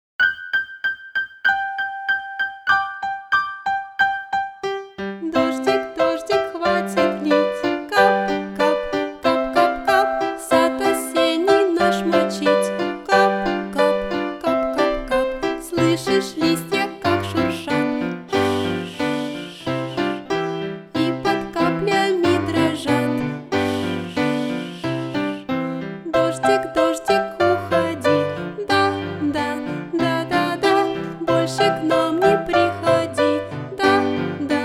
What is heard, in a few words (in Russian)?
detskie pesni dozhdik dozhdik pesni pro osen dlya detey